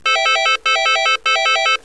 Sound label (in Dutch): Ericsson MD110 bediening